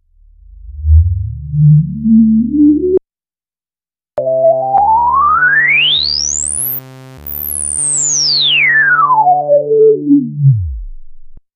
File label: Nord Lead 2 Saw Wave 24db LPF; Nord Demos; Nord Lead 2 versus 3